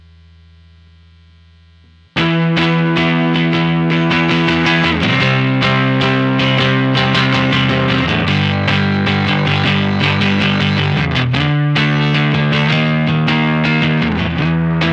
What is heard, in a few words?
fat quilter